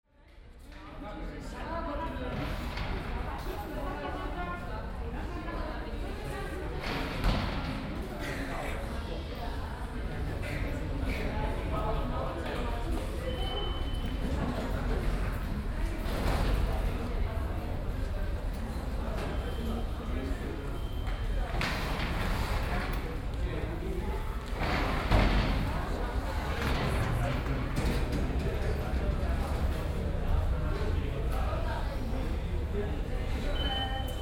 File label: paris subway